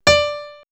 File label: House Piano; X-Static Goldmine; backtothe70's